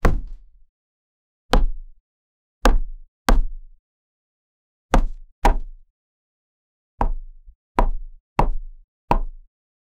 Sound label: character jump landing on, wood